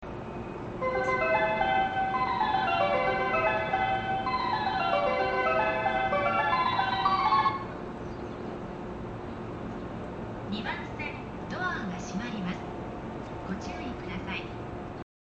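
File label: arakawaoki, departure bell; zyoban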